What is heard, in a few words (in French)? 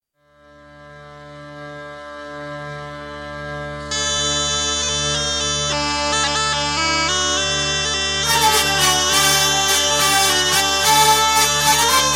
Bourree d'ete & Bourre valsee; TRAD; Bourree valsee de l'Octave; Bourree valsee de Montreuillon